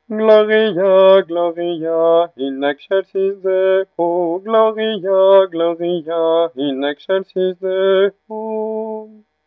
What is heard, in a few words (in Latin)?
gloria ténor; mariage